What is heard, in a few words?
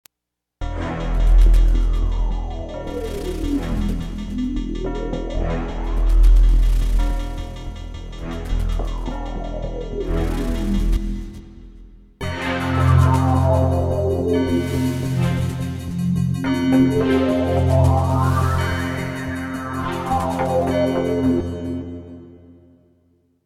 SY Wavestation